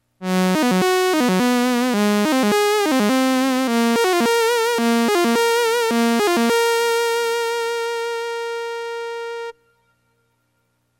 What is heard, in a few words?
trumpet